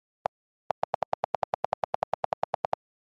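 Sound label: iphone keyboard delete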